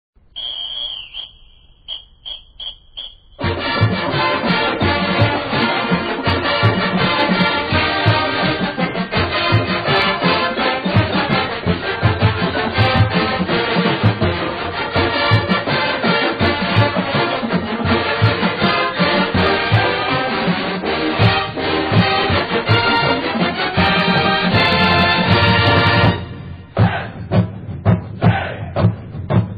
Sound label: band fight song